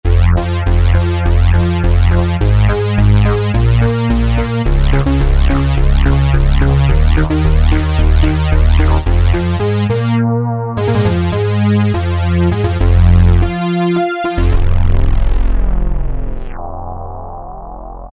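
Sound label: bass; E-mu Orbit V2; Factory presets bank 0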